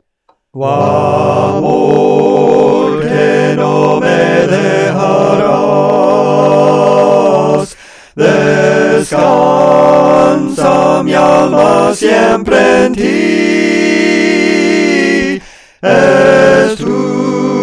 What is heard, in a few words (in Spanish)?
4 Voces, Cantos Espirituales; Las cuatro voces